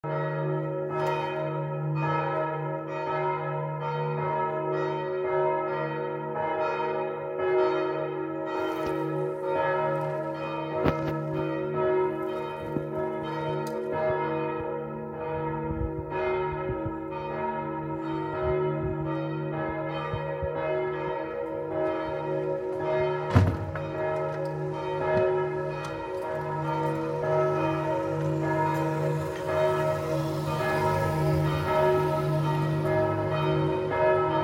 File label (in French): cloches; coronavirus